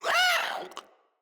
Infested Runner Hear Sound

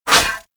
Disarm Dart Shot; Warframe; Pacifist

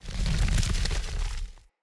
Stone Move Large Stop; Puzzles

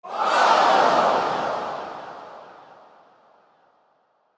Pain Empathy Crowd; Lunaro

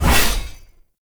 Blade Swipe; Warframe; Dagath Blade And Whip

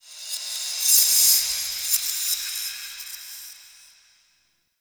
Zariman Metal Whispers; Warframe; The New War; Ambience

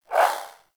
Swing, Weapons Melee; Warframe; Modular Melee

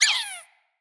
Floppet Single Squeak, Animals, Vocals

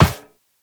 Operator Walk Metal Perforated, Footsteps; Warframe

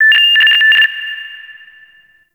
Interior Orbital Station Telemetry High; Warframe; Tau

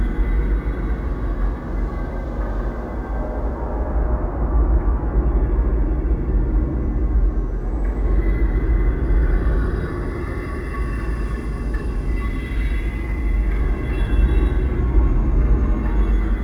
Asteroid Pipe Tunnel Amb Loop; Warframe; Grineer Asteroid; Interior

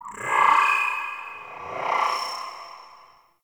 Class Lesson Slide, UI; Zariman Class Quiz; Warframe; The New War

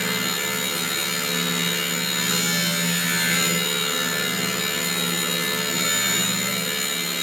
Sci Fi Light Buzz; Warframe

